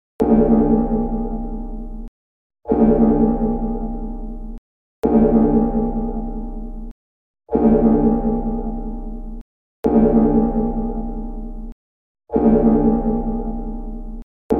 trevozhnyj zvuk; tardis

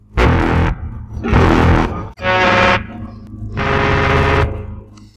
dron; iz oblivion

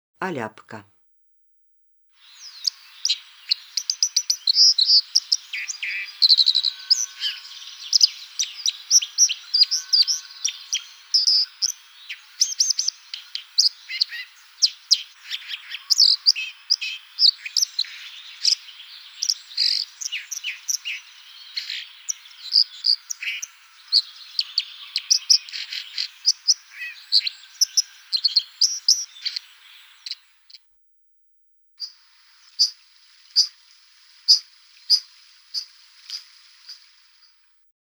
zvuki oljapki

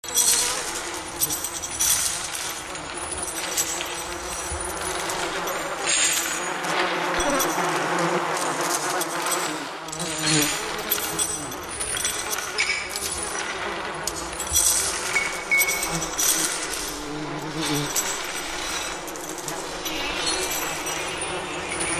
zvon cepej v temnice i muhi

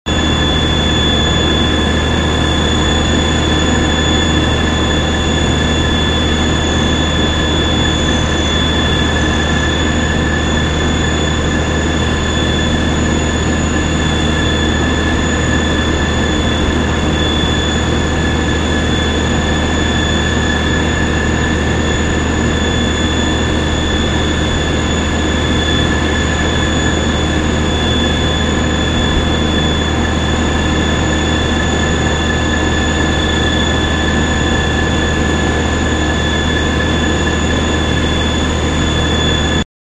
turbina na elektrostancii

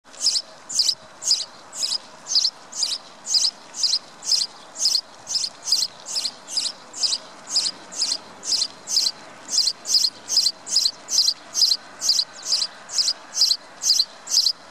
chirikane vorobja

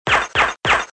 пистолет с глушителем

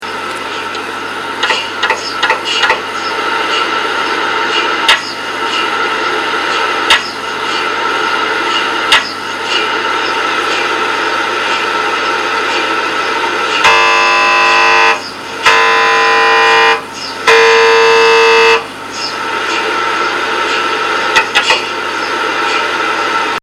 skaner mrt

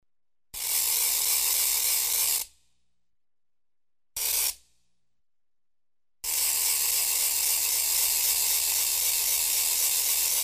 vraschenie treschotki

